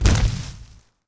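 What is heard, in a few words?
tree hit large; objects; Star Wars Obi-Wan; XBOX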